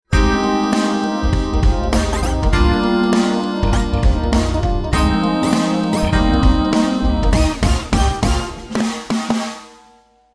Pop

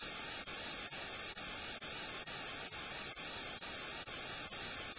Vibrato Impulses Noise Rem

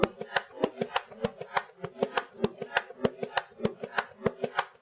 Bongo

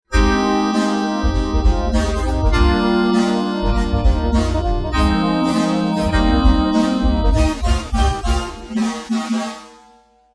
Pop

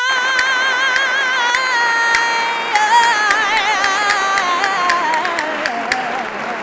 Vocals Castanets Applause

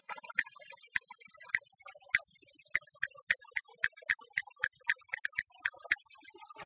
Castanets Violin Applause

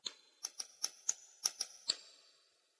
Glockenspiel

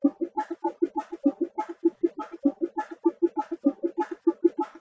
Bongo

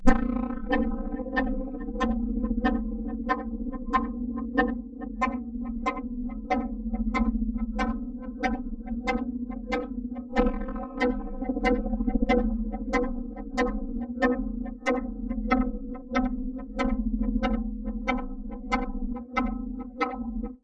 Synth Poly

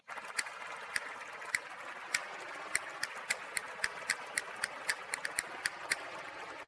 Castanets Violin Applause

